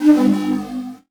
Multimedia Internet CD-Rom Flash Animation Musical